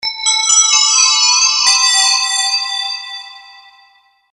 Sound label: Fat bell